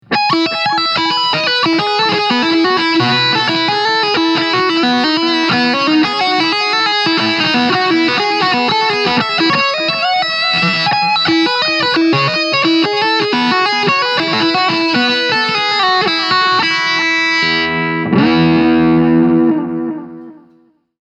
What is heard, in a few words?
Mooer Hornet 30 Tape Echo; Preset Mooer MTTape